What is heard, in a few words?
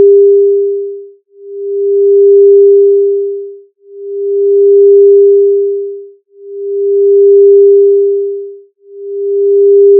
direction from phase 400Hz